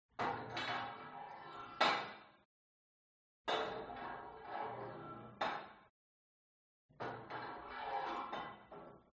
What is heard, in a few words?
GYM Put Weight Onto Barbell Reverby; SPORT; smartsound